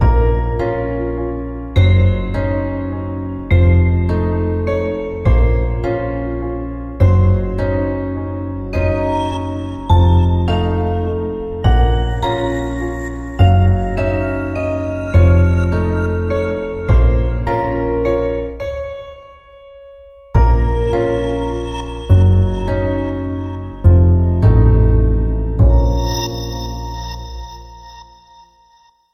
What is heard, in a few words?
slow walking